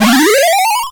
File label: retro powerup collect, Retro 8Bit Sounds